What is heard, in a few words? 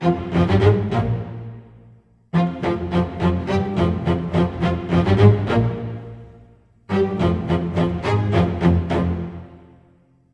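90 F minor, Low Strings, Orchestra; 90 s Sample CDs; PowerFX - Downtown Orchestra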